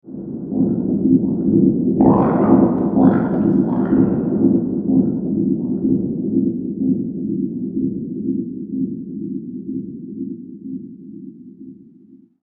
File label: Hall Of The Demon King Ambience; Scary Soundscape Sound Effects; fantasy